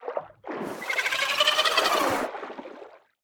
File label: creature pet; trivalve